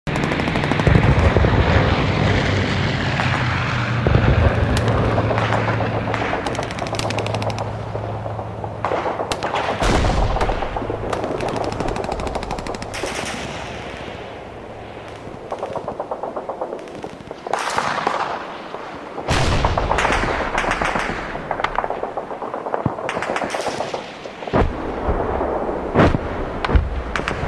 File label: war sound